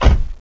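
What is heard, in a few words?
close trunk; svencoop; saker; baklucka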